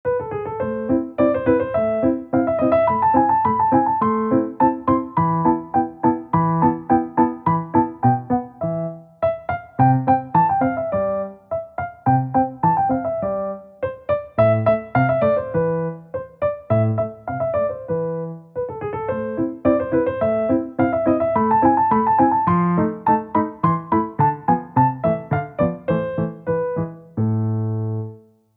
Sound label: Turkish March, Rondo Alla Turca, piano; Piano Sonata No. 11; K. 331; Mozart; 20 Piezas Simplificadas para Piano